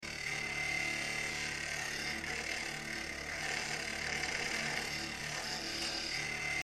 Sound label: cutter; Mindustry